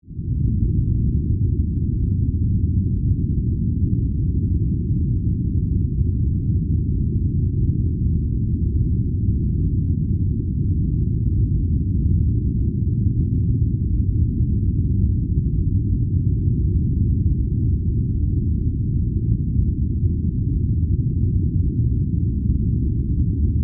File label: Drone Rumble Warble, Scifi, Drone, Rumble, Warble